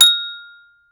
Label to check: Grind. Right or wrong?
Wrong